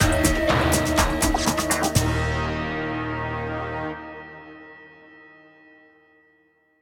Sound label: Marching to battle lose stinger